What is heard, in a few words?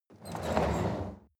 chair wheel roll on floor medium squeak